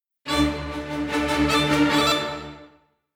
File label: Combat Out; Skellige